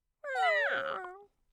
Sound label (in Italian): miao languore